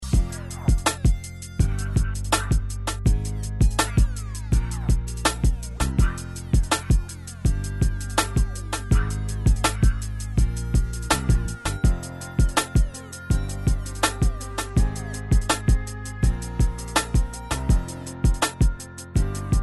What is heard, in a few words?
Slow Funk Flow